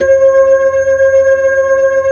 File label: B-3, Blues; Keyboards of The 60's and 70's